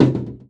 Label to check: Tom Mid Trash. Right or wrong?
Right